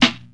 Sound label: SNR, Snares